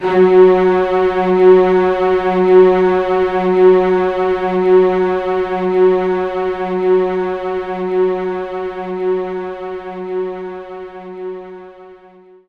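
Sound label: String Loop F sharp 2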